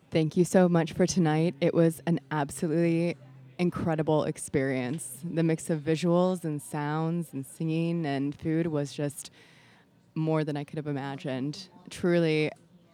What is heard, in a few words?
Audience Recording; a-meal-premiere